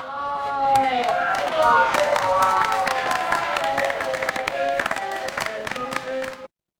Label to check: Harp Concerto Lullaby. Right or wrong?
Wrong